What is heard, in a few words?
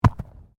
Body movement Fall Heavy